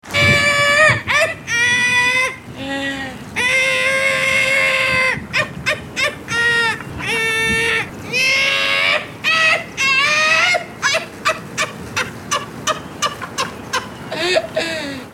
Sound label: laff